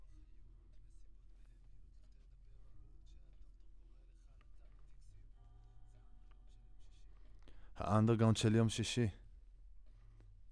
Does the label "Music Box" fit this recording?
No